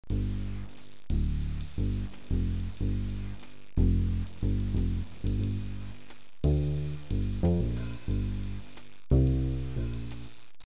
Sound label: Loop; Music Toys; TROID